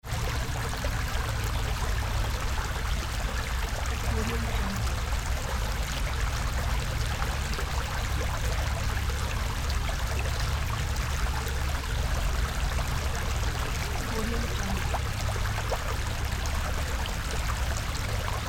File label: stream in city park medium distance